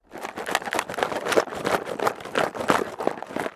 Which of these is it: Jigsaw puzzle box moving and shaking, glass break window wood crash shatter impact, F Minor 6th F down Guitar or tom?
Jigsaw puzzle box moving and shaking